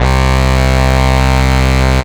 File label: Ensoniq SQ-80 Sax C4